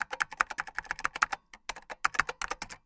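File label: computer keystrokes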